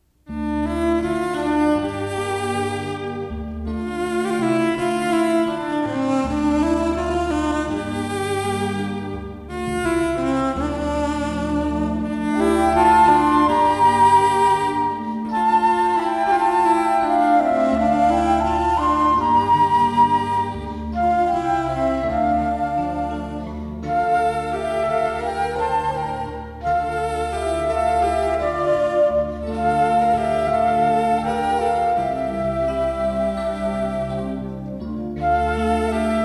Keys Violin and Flute, Jewish Wedding; Wedding Ceremony Music

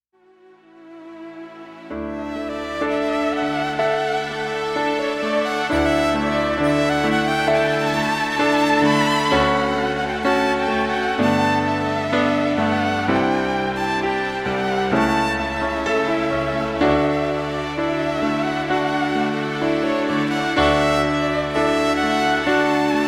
Keys and Violin; Cocktail and Dinner Music

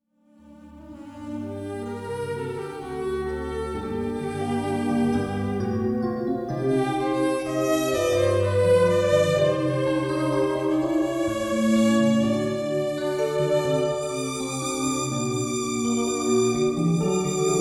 Keys and Violin, Jewish Wedding; Wedding Ceremony Music

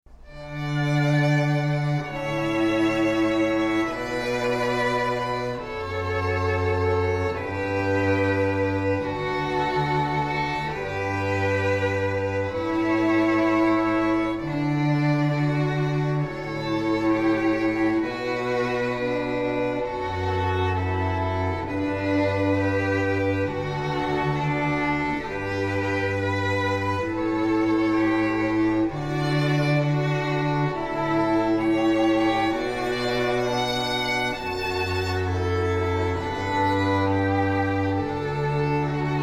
Canon in D String Trio; Wedding Ceremony Music

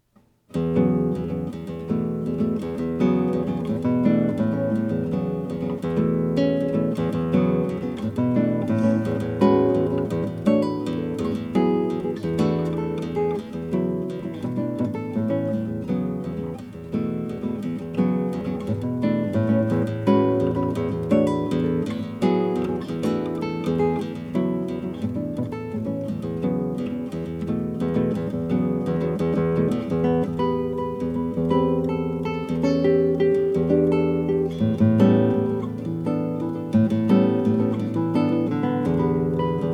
Acoustic Guitar; Cocktail and Dinner Music

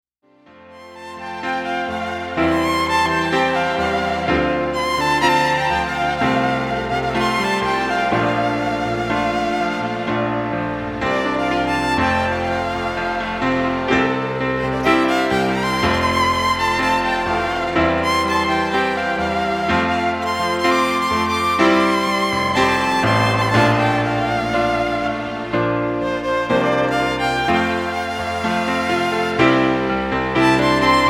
Keys and Violin; Cocktail and Dinner Music

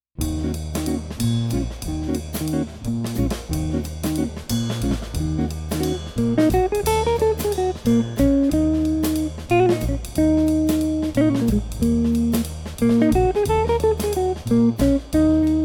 Guitar Bass Drums Trio; Cocktail and Dinner Music

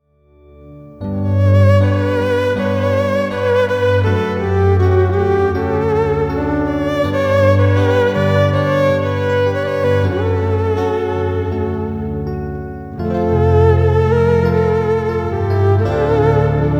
Keys and Violin

